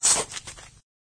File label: plant; pypvz